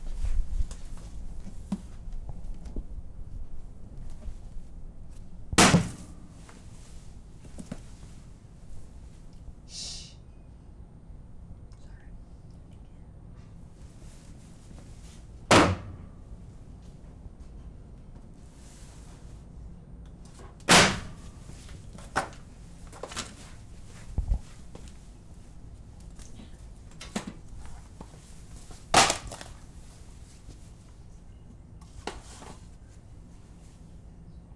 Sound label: Bookdrops